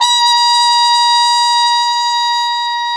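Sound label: Plunger Mute, Brass; Roland L-CD702; Plunger & Wa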